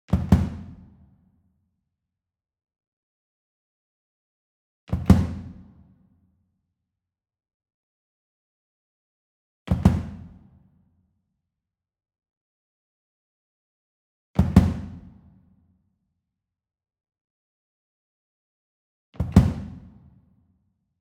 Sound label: Small Drum Kick Drum Double Hit Medium; boom library; Cinematic Strikes Construction Kit